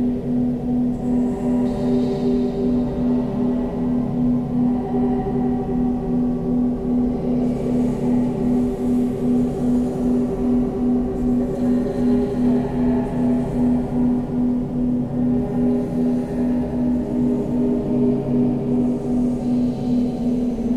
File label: skinwalker goatman search spots; slender skinwalker